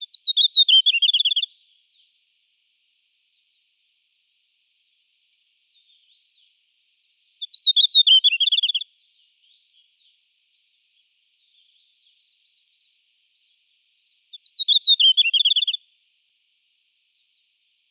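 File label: sinehvostka golos, red-flanked bluetail voice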